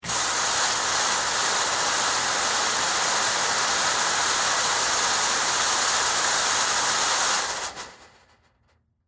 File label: Smoke Machine, Theatrical, Stage; Film Set Sound Effects; BlastWave